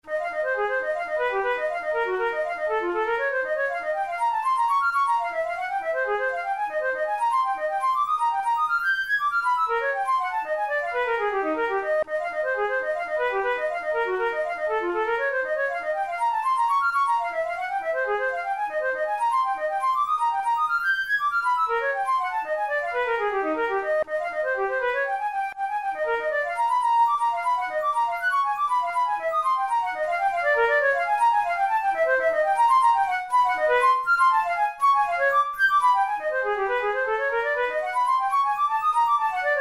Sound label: studies for flute solo; karg-elert